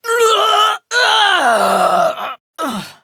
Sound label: Death Prime Grineer Female; Tau; Battle Barks